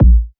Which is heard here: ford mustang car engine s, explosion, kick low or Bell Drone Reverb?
kick low